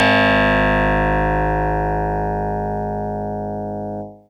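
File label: Harpsichord DX100 G sharp 0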